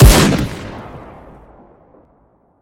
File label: weapon; Detective Mastery; Revolver